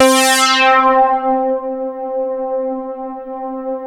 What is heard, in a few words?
LEADS; Masterbits; Vintage Voltage